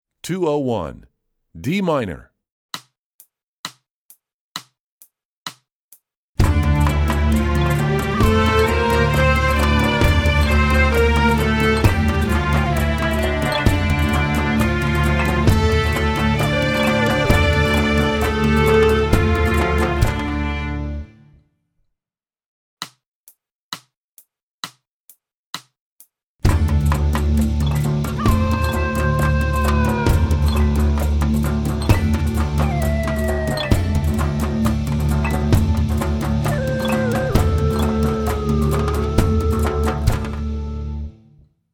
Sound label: D Minor, Strings; EE2000 Book2